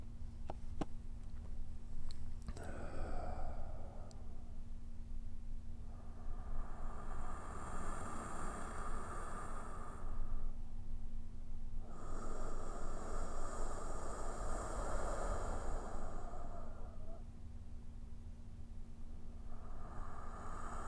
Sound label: breathing